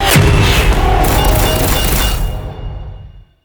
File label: skill acid load